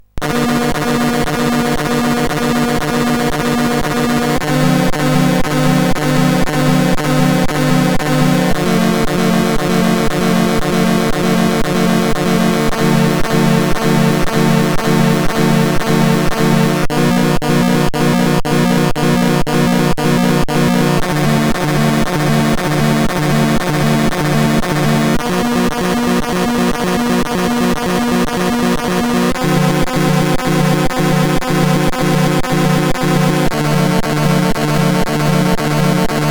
BASIC, CSG 8580 R5; Glass Jaw

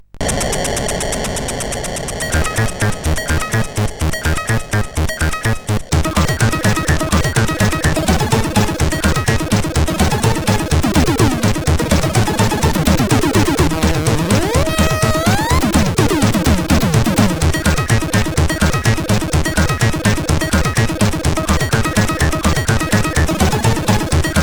Heavy Beat, sid CSG8580R5

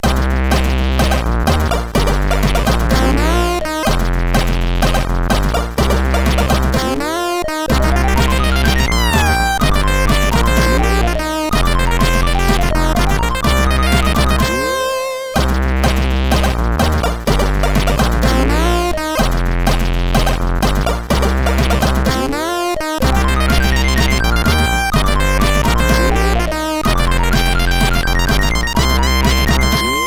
Farting, CSG8580R5; hvsc

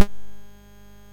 Project Firestart, MOS6581R4

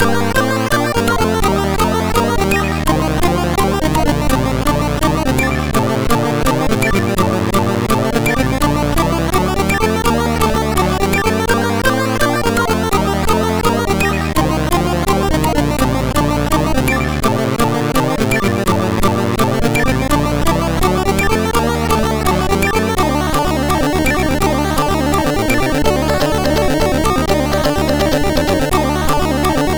sid MOS 6581R2; STII8

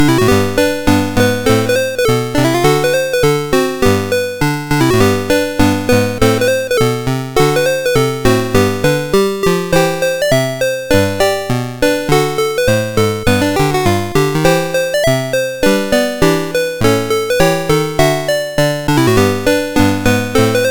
sid MOS6581R4; Donald Ducks Playground

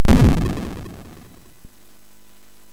SFX, MOS6581; Narco Police; MOS6581R4; hvsc

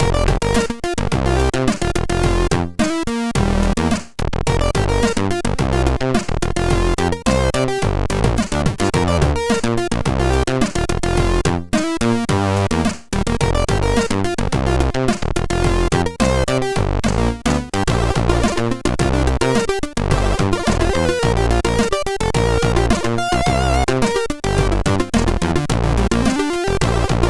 sid MOS6581R3

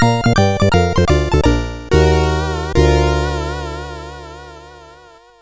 Break 64, sid CSG8580R5

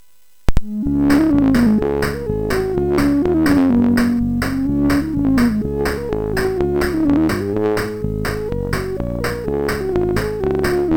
sid MOS6581R4, GAMES; Aussie Games

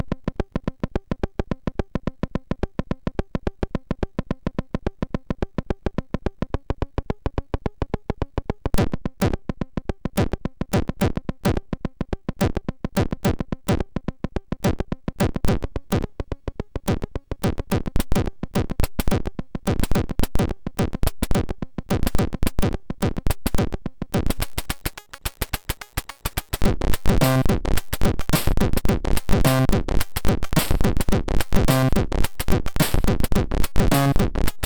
Crunch Funk, MOS6581R3; Akira K

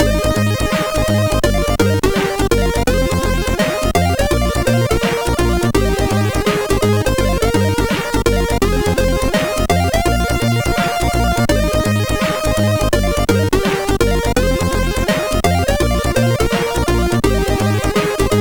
Intro, MOS6581R4

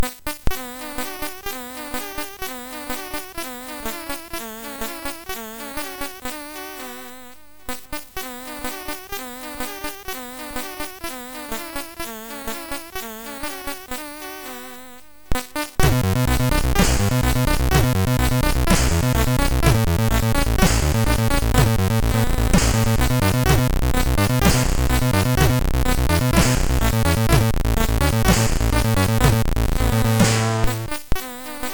Echo Melody, MOS6581R3; sid

